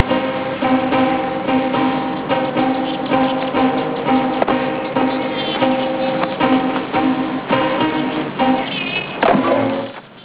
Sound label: plucking